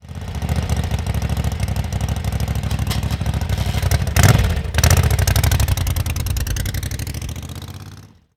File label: Ducati 750